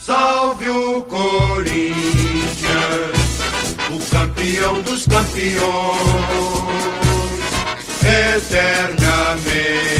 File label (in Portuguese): hino corinthians cantado